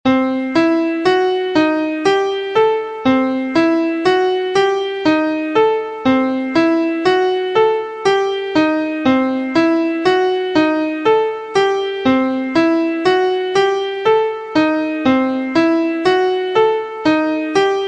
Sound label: Degree 1 b3 3 b5 5 6 Closed Position Key C; harmonic and melodic equivalence; two triad pair